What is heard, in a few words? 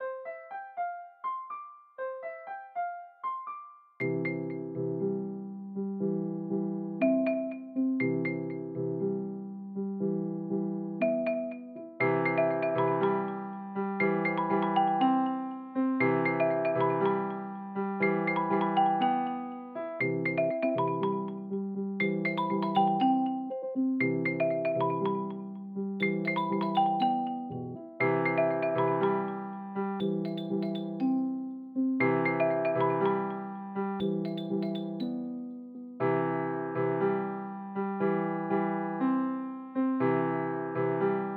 happy memories piano; inbento